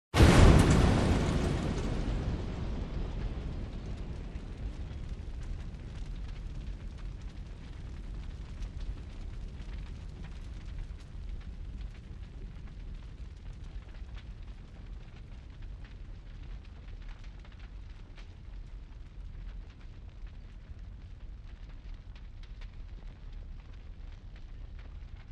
Fire Explosion

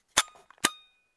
Camera Shade Lock

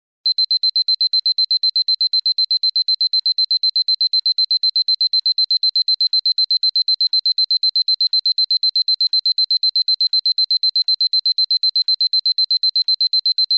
Digital Alarm Clock Sound

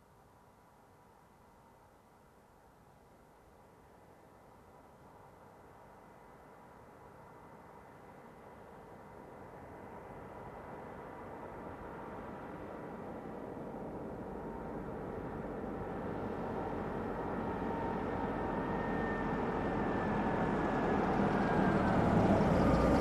Old Truck Parking

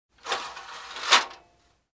Shaking box of matches sound

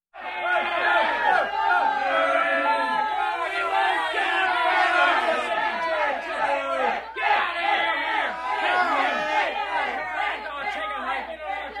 Crowd arguing and chanting

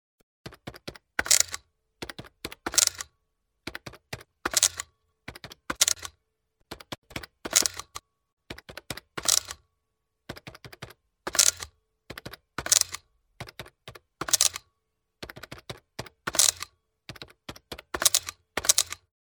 Typing and printing labels

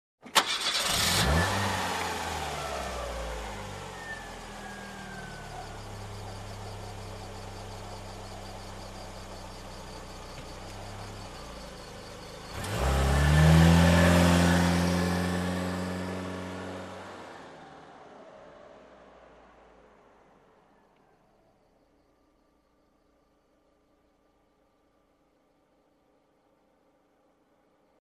Car engine ignition and drive away

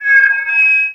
Megaphone Feedback

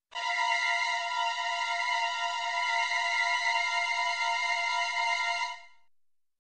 Horror movie tension

